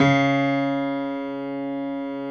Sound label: piano stereo C#2; AKAI S6000 CD-ROM - Volume 1